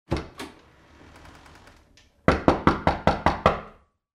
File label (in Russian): dver otkryvaetsja potom v nee stuchat; Звук стука в дверь